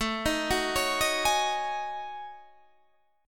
Gb Minor 6th Add 9th A

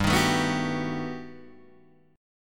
G Augmented Major 9th, Guitar, Open D

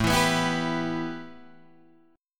A 7th Suspended 2nd A Guitar Open D, chord

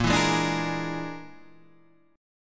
Bb 7th Flat 5th Bb, Guitar Half Step Down; Bb7b5

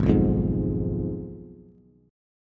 Ab Minor 6th, Ab, Bass; Abm6 strummed; JGuitar

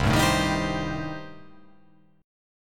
C Minor 6th C

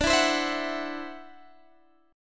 E 7th Suspended 2nd Sharp 5th D, chord

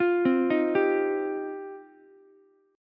F 7th Suspended 2nd F Ukulele; arpeggiated; chord; F7sus2; 10,12,11,10; JGuitar

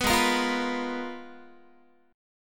Ab Minor 6th Add 9th, Ab, Guitar; Abm6add9; strummed; 14,16,13,15,15,x

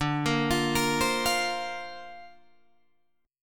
D-Minor 7th Sharp 5th D Guitar DADGAD, chord

